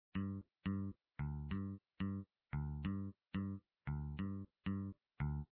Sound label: Bass; Papa Louie: When Pizzas Attack; Windows gamerip 2006